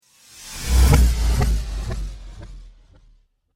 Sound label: Trailer Hit Falling Delay